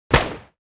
gunshot; ShootingRangeGame

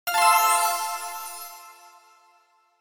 shine

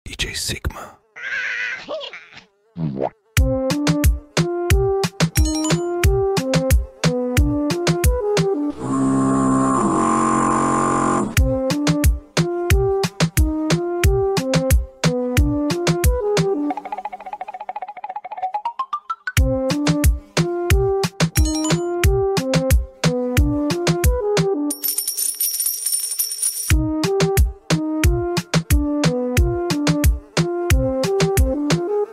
main menu theme; CaveQuest

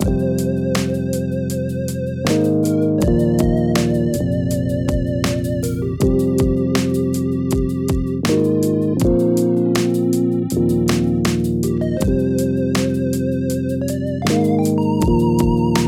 Stream Loops

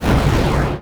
Turret Death; FPS; Enemies; FirstMicroGame

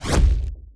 Launcher Explosion; FPS; Weapons; FirstMicroGame

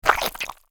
sludge

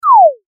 death; pygame; vertical platformer

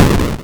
explosion; brackeys platformer assets

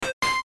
pickup; The Mercenary Chronicles

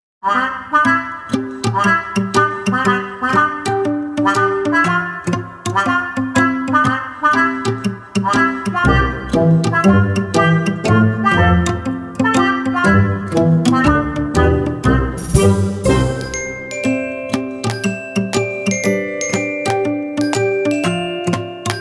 a little quirky; python and pygame

